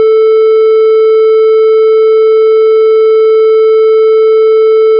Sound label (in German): dreieck